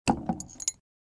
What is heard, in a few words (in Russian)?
kryshka padaet